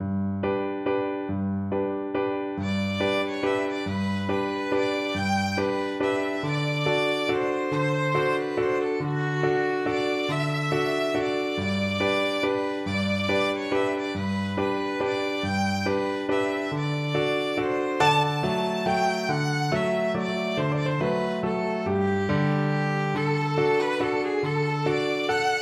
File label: violin